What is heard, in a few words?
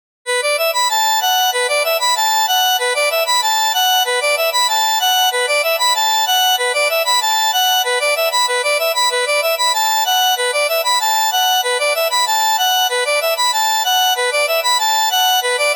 Synth